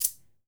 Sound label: Shekere; percussion; Cymatics - Infinity Beta Pack 2.0; Zeus Percussion Collection